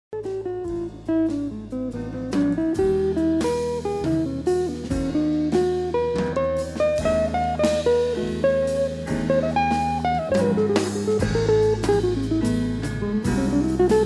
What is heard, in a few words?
Big Band